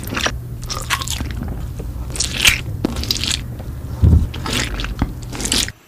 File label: asmr